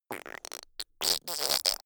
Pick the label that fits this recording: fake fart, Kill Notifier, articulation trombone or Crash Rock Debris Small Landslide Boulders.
fake fart